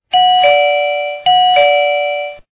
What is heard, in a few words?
dingdong, doorbell